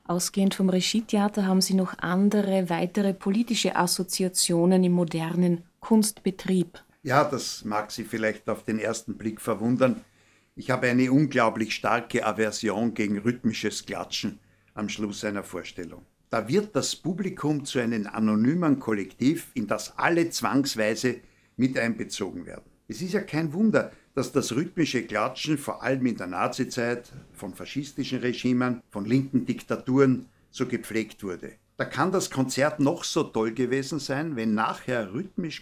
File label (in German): Rhytmisches Klatschen